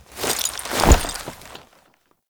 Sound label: Horse Foley Mount; Vehicles; Foley Actions; Warframe; Duviri